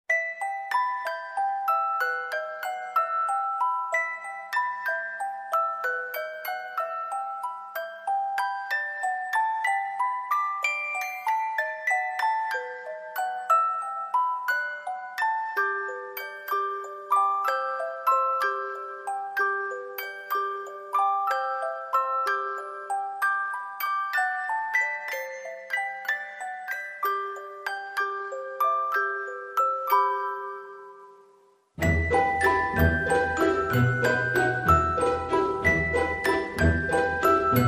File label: No Flute No Trumpet